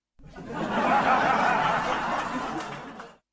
risa corta

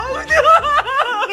risa

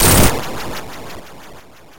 puente rompiendose; sonic

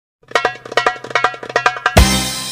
maracas

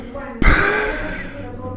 risa grande

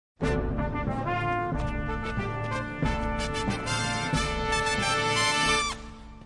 corneta pitch

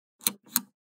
click boton

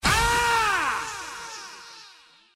grito

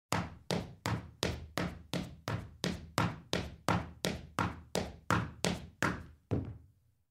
pasos sobre madera

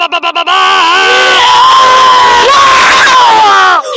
gritos espanoles ahahaha

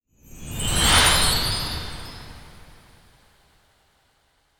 vibracion navideña

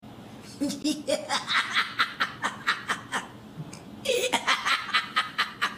risa

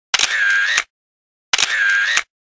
camara de fotos

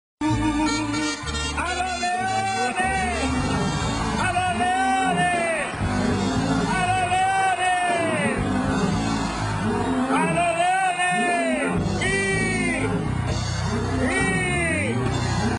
trompeta

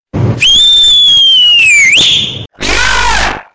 silbid0 down

